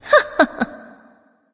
heh heh; smite; serqet

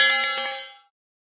spear drop; smite; Anhur

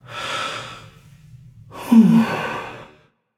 breath, voicepack; smite; jorm

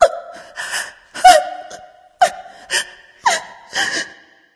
boohoo; smite; aphrodite

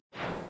sword swing; Smite; Cabrakan

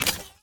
zombie step; smite; ahpuch skin10

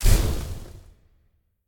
projectile; smite; god serqet

